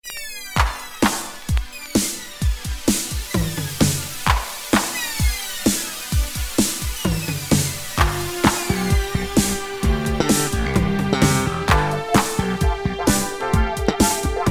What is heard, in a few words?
BOOGIE FUNK, funky